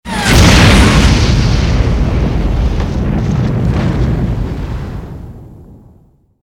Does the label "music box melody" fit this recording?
No